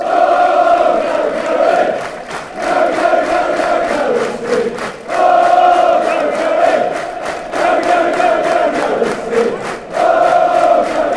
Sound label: oh gary gary clapping, chants